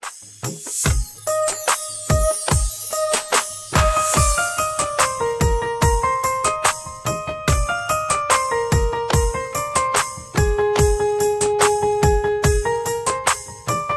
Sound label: instrumental ringtone